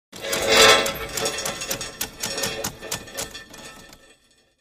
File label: Drawbridge Ratchet